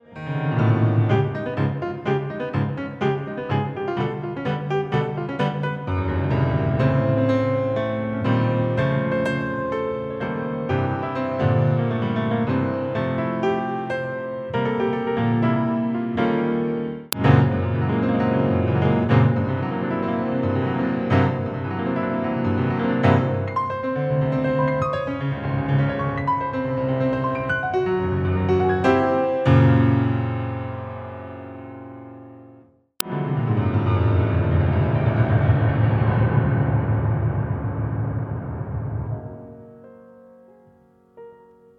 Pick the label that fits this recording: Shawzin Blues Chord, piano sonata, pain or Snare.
piano sonata